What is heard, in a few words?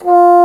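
Bassoon p F1; Digital Sound Factory Virtuoso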